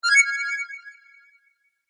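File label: instant message sound; Мгновенный звук на смс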